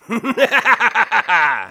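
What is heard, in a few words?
laugh evil, German; Soldier; Böses Gelächter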